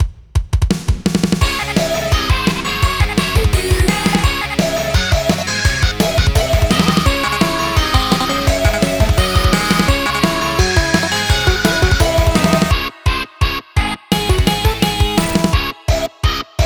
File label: Strings